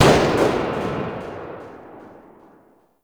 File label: Grineer Emplacement Tail; Weapons